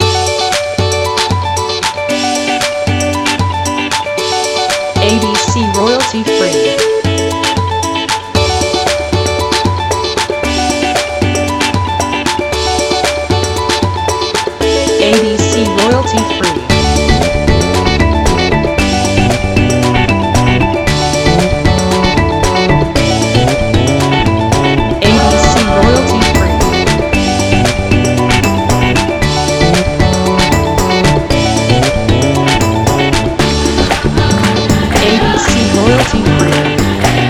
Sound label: Africa Pop